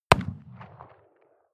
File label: revolver shot 44cal remington 25m rear; schusswaffen